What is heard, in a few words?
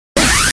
wire move